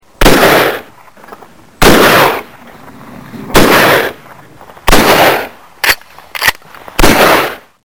Shotguns

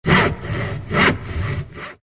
Sawing Wood

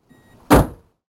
miata

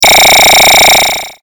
BUZZ

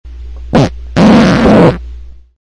fart

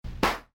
Ballons Poping

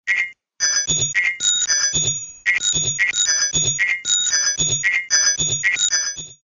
tinkle

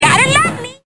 baby dinosaur

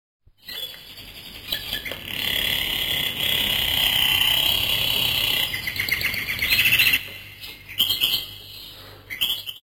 Gold Finch

